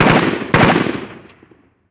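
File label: gun m16 medium; ModernWarfare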